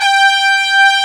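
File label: Orchestra Brass, Roland; Club-50 - Foundations Roland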